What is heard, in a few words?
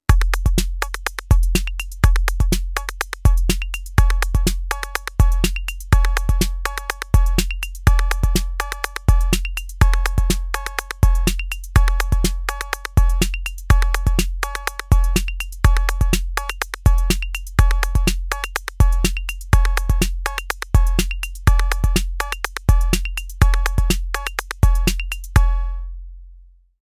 522 Perc